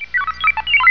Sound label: ELEVATR